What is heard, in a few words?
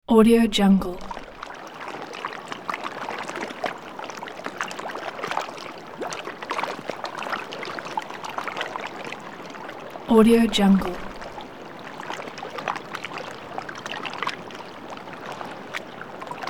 small waves lapping against rocks; sound-ideas